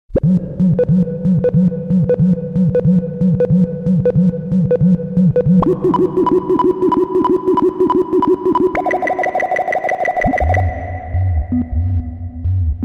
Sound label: electric; Universal Sound Bank; Soundscan 14 Twisted Special FX